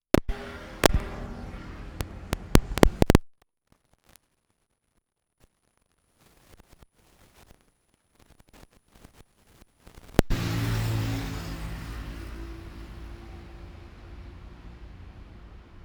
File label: Bangkok broken recording; Bangkok-Koh Tao